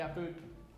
Violin

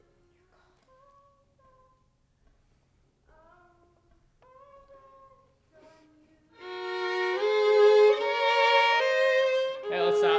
violin

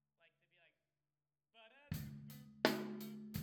Rack

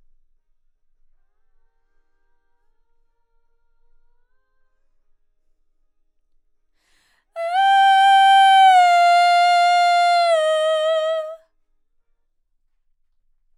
Vox start new

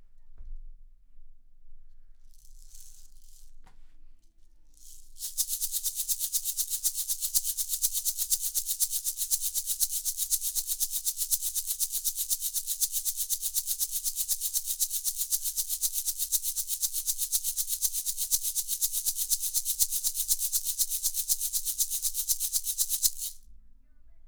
Shaker

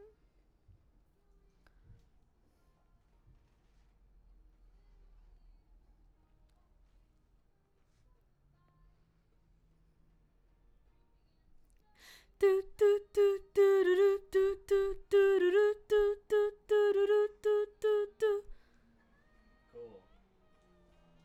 BG Vox

